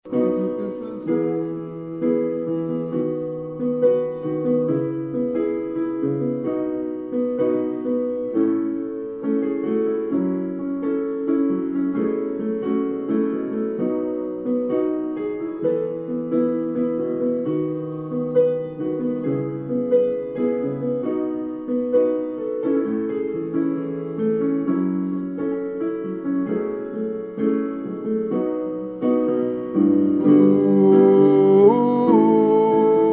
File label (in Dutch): bas